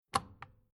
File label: Industrial Red Emergency Stop Power Off Button Pulled Back Out Reset Electrical; Switch